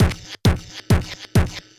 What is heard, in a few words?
Tech loop